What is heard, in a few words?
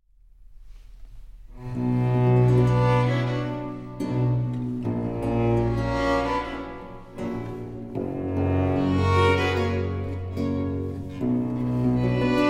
concerto for cello in C minor, adagio